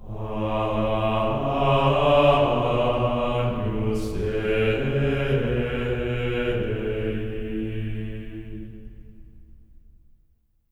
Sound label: AGNUS A3, Symphony of Voices; Spectrasonics; GR AGNUS